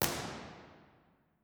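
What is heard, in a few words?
room impulse response, concert hall; jack lyons; university york; b-format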